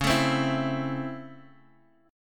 C sharp Minor Major 7th Sharp 5th, Guitar, Half Step Down